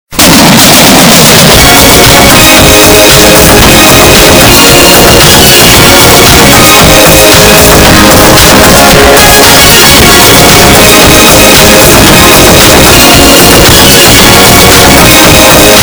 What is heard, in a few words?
bass boosted